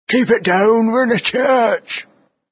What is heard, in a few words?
Zombie Voices